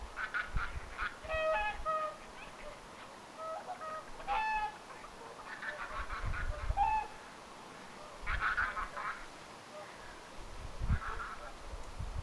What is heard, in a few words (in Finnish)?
metsahanhen aantelya